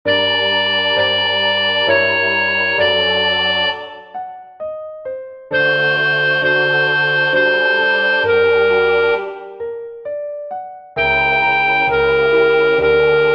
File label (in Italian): Soprani; G.B. Pergolesi - Stabat mater; 3 O quam tristis et afflicta